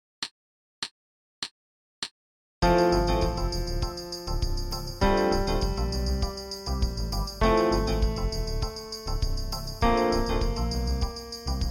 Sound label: F Concert Whole Notes